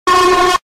jumpscare sound; fnati nbd

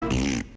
foot stomp; monty python

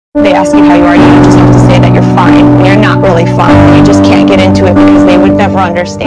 bass boosted

